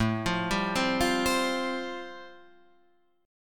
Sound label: A-Minor 7th Sharp 5th, chord